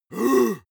monster growl attack deep; kreaturen

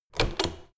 door plastic handle use hard

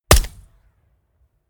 gun shot silencer designed realistic; schusswaffen

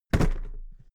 briefcase plastic drop

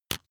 razor fold out hard; maschinen; rasierer

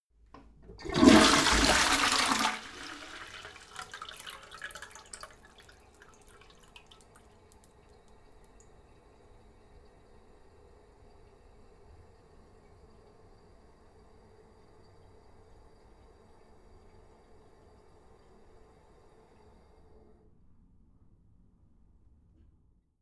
toilet flush short full inflation; haus garten

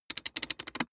metal ratchet rattle; industrie wirtschaft; metall

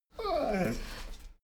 dogo argentino whine short

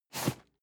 cardboard box medium slide short hard; objekte

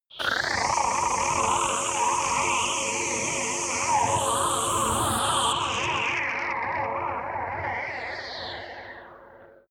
fuse repair shuttle coachwork; laser; soundpool

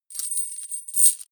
metal chain jerk

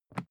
briefcase plastic pick up; industrie wirtschaft; bueroarbeit

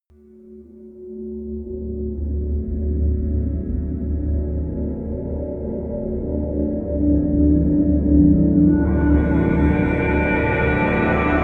swirling meditation, music elements

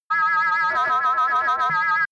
crazy scientist, energie

